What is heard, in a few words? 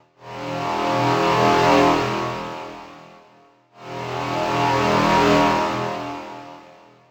Pad Loop E 135, Techno; Ghosthack; AC2024 - Day 1 - Techno Sounds 2025; Pads